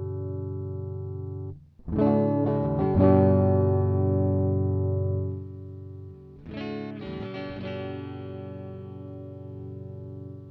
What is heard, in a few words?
Neck Various Settings d g em strum